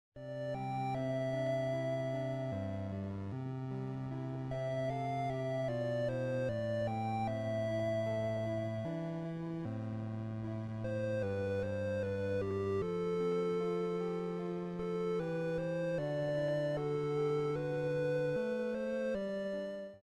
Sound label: fantasy game gb; Zomboid; Video Game Consoles